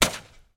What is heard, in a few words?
warfare gun shot rifle range